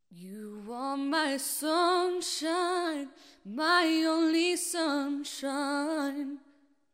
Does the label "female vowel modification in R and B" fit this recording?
Yes